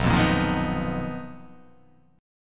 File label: B Major 7th Flat 5th B down, chord shape